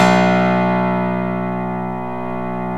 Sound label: PIA SYN; Best Service Gigapack 1